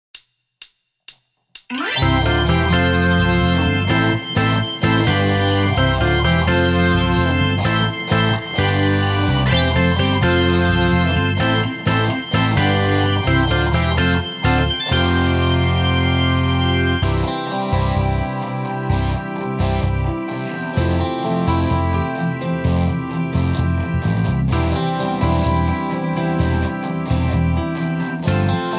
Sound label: Pop Rock, V-Drums